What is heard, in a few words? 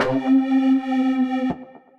Slightly Burned, Synth Lead; Ableton; Glitch and Wash